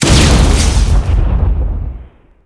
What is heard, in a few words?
airplane engine exp; WAR